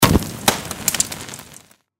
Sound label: torch Light; tiki-beats; eyecon